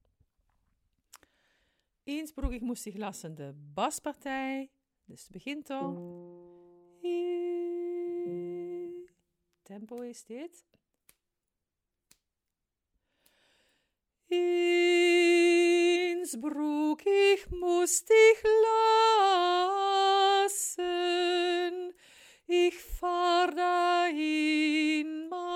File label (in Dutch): bas; innsbruck